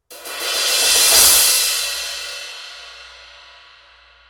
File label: 18in Custom EFX